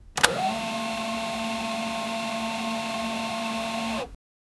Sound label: 3d printer running; 3d printing